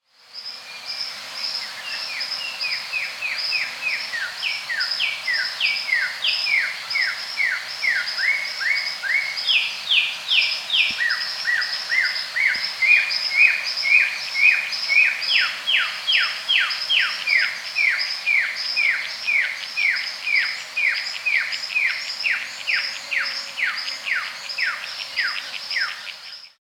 Carmiol's Tanager (Chlorothraupis Carmioli), Andean Birds; S. America